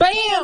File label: bam; Voice; ooh ahh